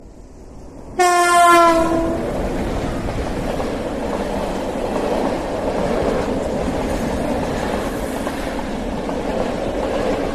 running train sound effect

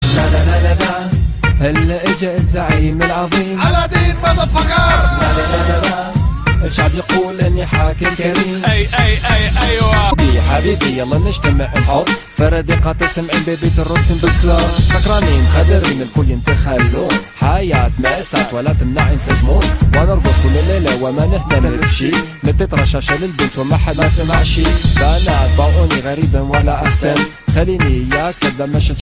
cute funny ringtone for mobile

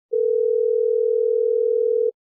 outgoing call phone tone ringtone

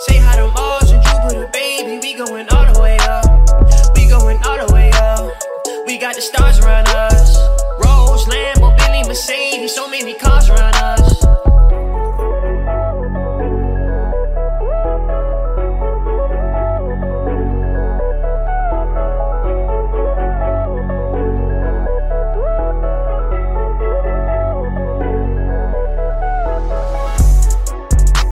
english ringtone

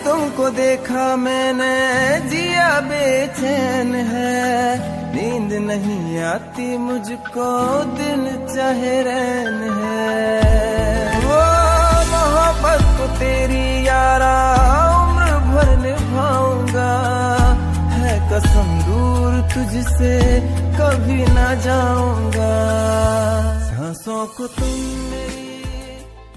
bollywood ringtone

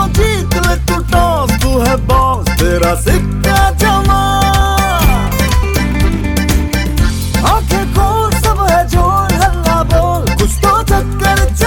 hindi ringtone

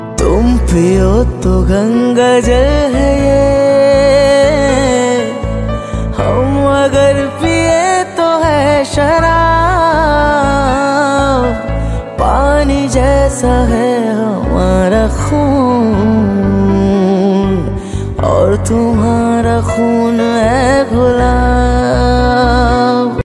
new hindi music cool ringtone

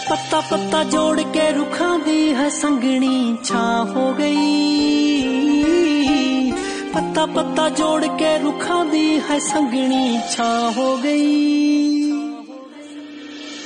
funny music ringtone

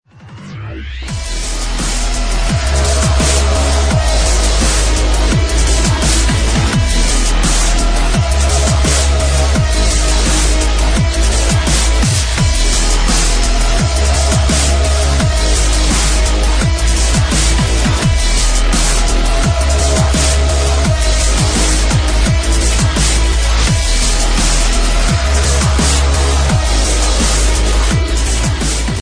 dubstep remix ringtone

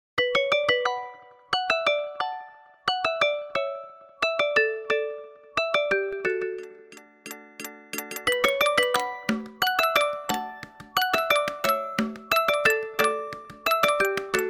acer ringtone; liquid zest plus

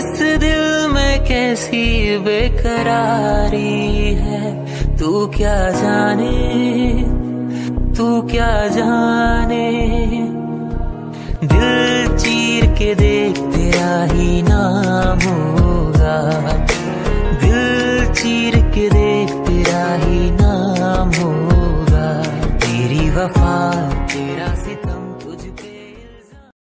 hindi ringtone

